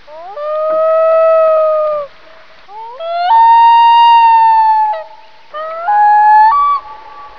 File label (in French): chant du huard